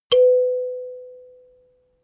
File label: notification kalimba octave high